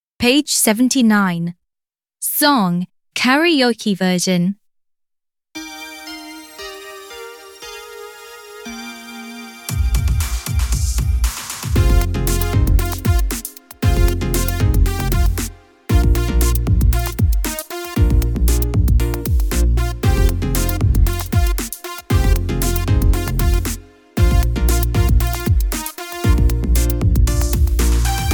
song karaoke

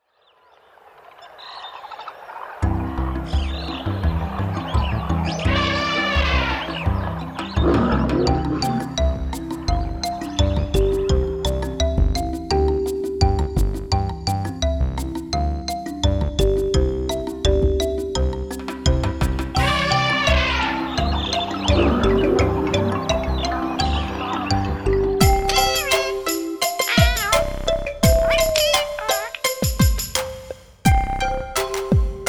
karaoke

